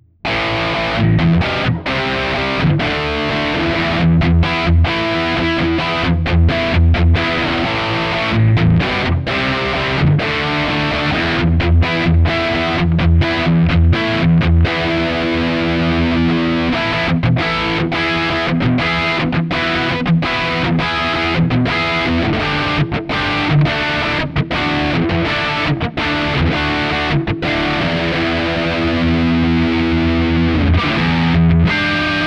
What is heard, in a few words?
bridge neck highgain rhythm; Sire Larry Carlton S3